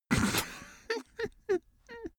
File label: cartoon male laugh snort